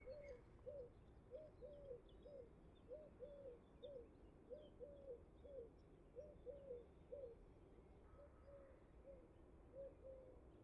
tourterelle turque

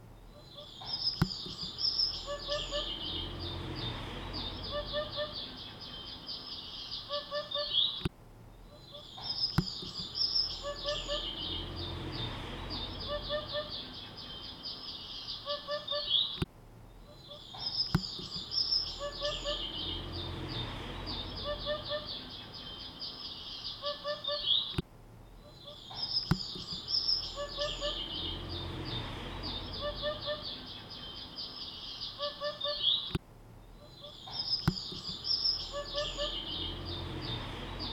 huppe fasciee

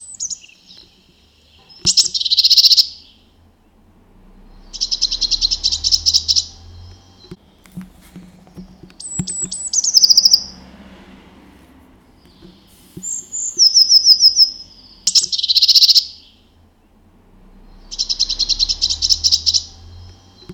mesange bleue

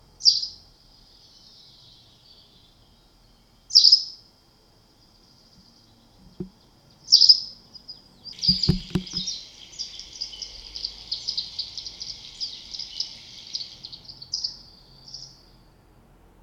bergeronnette grise

